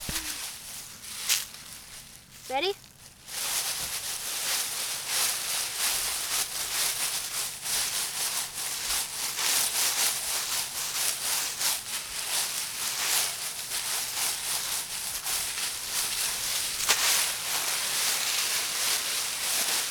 Leafs Rustling

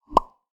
Pop In

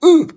Getting Hit Eeeh Mouth Fx Man Voice

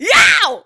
Yeow

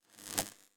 Electric Wire

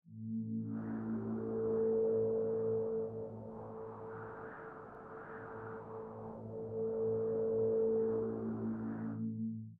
Flying Castle Ambience

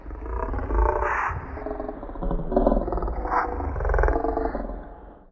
Animal

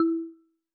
Marimba

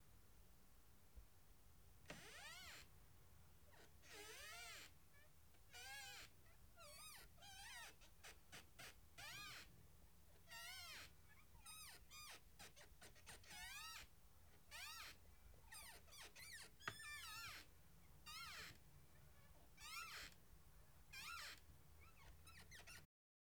Dolphin Noises

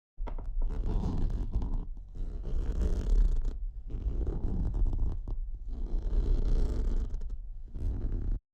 Rope Sounds

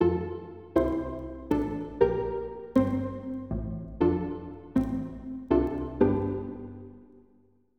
Vintage Jingle

